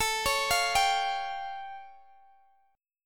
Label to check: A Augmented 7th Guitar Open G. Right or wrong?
Right